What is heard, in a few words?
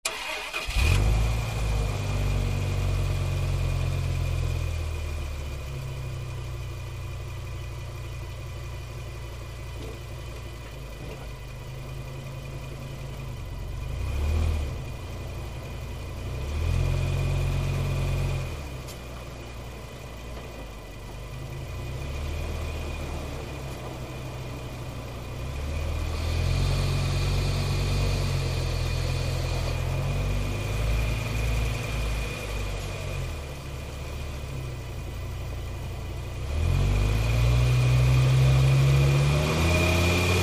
Dodge Ram: On Board Various Driving Maneuvers. Mic Tail Pipe And Engine.